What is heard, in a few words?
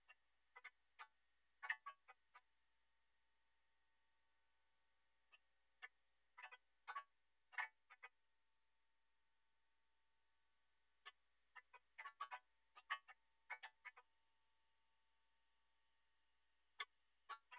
Creek; Garden